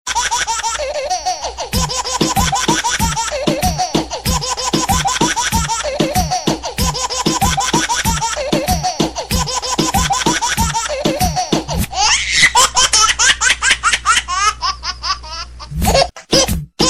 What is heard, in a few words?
Baby Ringtone